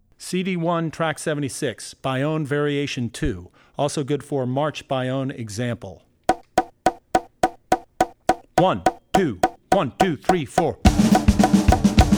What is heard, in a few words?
Baiao; Drummer's Bible Tracks; Baiao (Variation 2)